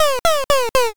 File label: witch laugh